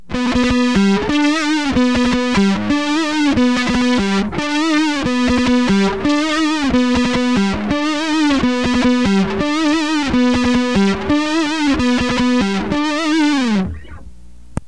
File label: guitar ten